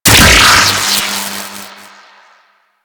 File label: Chain Lightning Rifle Shot A 3D; Crp Chain Lightning Rifle